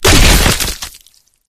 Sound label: bodyfall combat form; flood